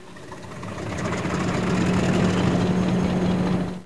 engine fire; Y7100; OpenBVE; essai wagon

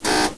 buzzer, Train; Cl323; OpenBVE

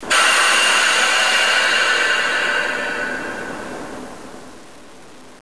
emergency, CLASS 47, Train; OpenBVE

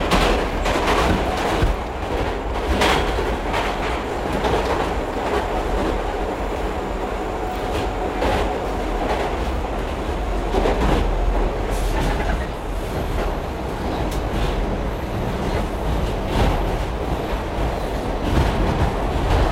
RUN, Train, R62 Kawasaki; OpenBVE

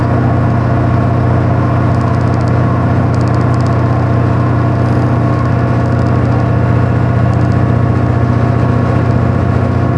motor, train, class 66; OpenBVE

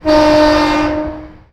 Klaxon, Train; OpenBVE

